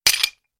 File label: lock fall; pond5